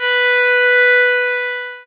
sax

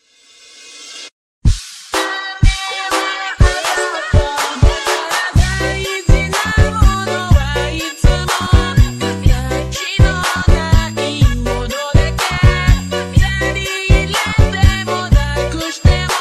boombox; wibu

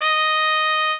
mexican trumpet

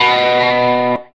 electric guitar

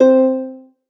lyre

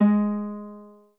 spanish guitar

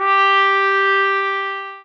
sax

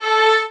violin

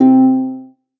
lyre

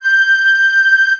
flute

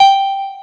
piano

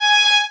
violin

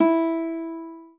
spanish guitar

